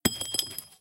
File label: shells 20mm ground